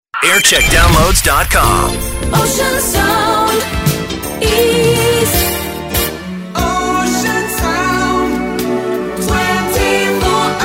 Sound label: jingles; ocean